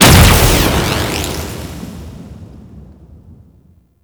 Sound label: Pet Blast Explosion; Alad V; Abilities